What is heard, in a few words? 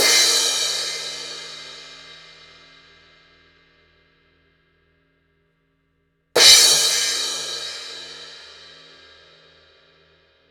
Crash, cymbals